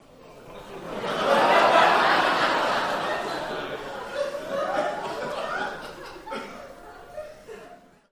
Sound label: Laughter Med Crowd In; medium